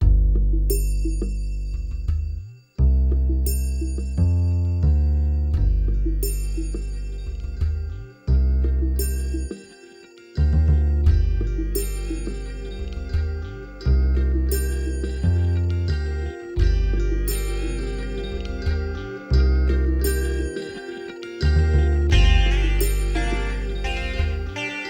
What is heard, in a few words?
Shimmer Dub